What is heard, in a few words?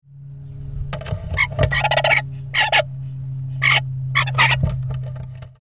starling